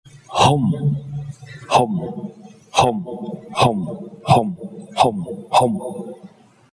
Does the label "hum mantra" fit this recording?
Yes